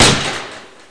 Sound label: SHEET; mtm2demo